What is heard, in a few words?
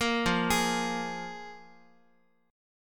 F Minor Double Flat 5th F Guitar; Fmbb5 arpeggiated; JGuitar